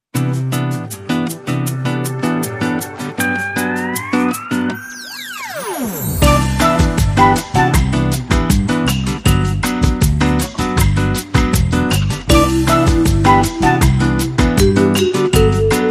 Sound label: Golden Bossa Retro